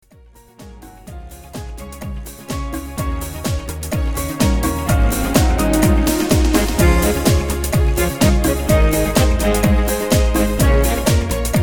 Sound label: cha cha